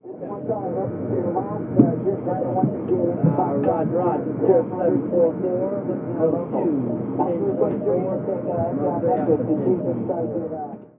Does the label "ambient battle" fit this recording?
Yes